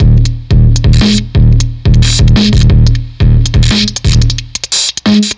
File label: Fuzztest drum broadcast